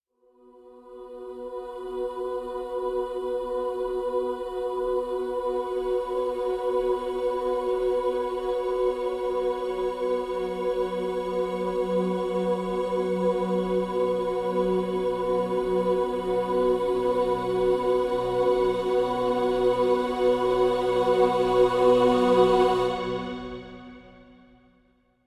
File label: String (B)